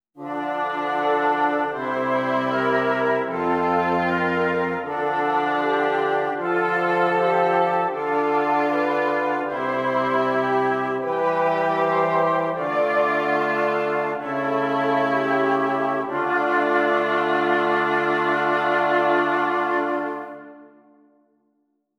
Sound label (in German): Stimmiger Contrapunkt; Ronge